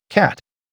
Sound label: cat; on tap ve chu de dong vat; tu vung ve vat nuoi